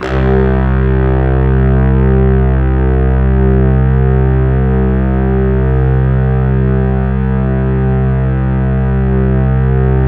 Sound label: C2, Synth Line; 90 s Sample CDs; NiceBeats; SynthLine VOL-27